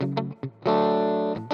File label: GTR